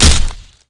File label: crunch